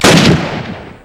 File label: M1 Grand Single Shot; BlackCow